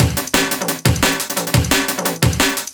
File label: Like That Beatz, breakcore breaks